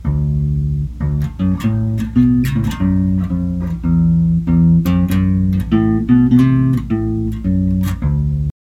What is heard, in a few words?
FULL TREBLE